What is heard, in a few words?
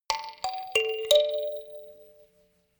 notification kalimba rattle sequence major